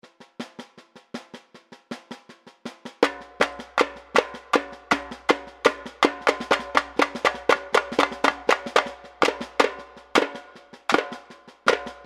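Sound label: break, samba; Pirate Samba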